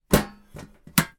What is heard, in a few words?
office metal file box lid close lock